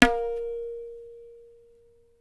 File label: tabla; Dirt-Samples